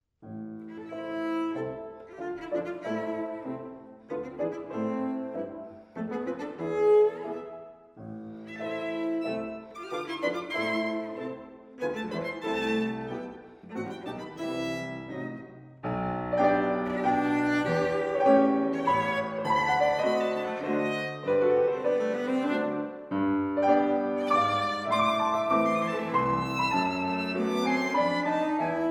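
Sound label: piano trio; jadassohn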